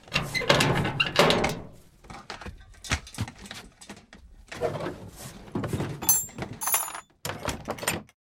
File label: shed; DayZ Epoch